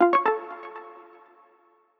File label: warning; Fennix; Dummy audio